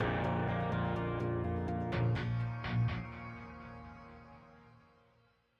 music interactive; World of Tanks

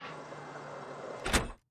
Aircrafts Su-27 Cockpit Canopy Close End Cockpit; DCS

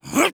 jmp

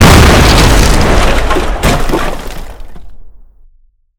Amb Destruction; Red Orchestra 41-45

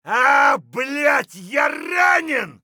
hurt light; Escape from Tarkov

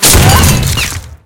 tank hit he small; tanks; War Thunder

